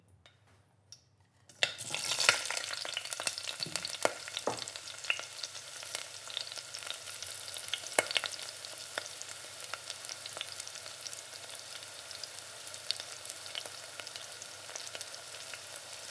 Frying In A Pan

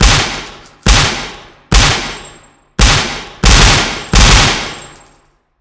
Gun Shots Single Burst Parking Garage

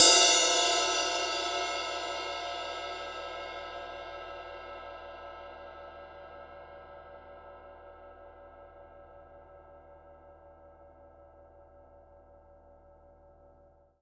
Ride Rim

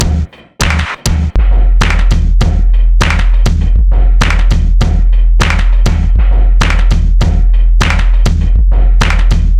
Drums; Lunar Vibes

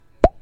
Pop

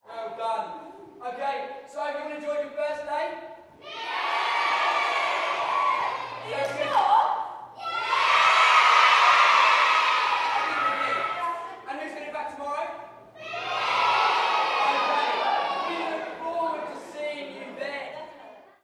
Kids club

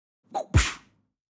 Swoosh Punch

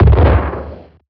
Retro Small Bomb Explosion

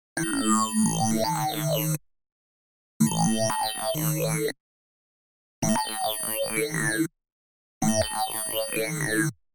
Ring Tone

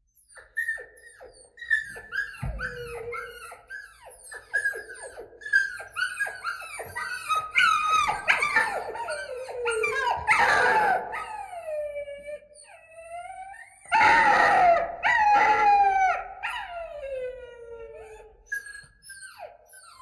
Puppy; Jake The Puppy

